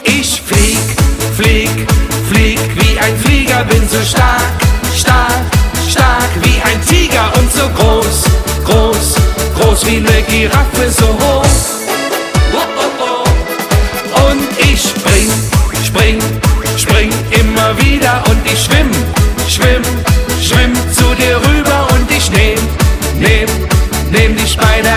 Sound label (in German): musik; jail old